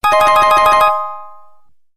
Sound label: iphone sms ringtone; gossip girl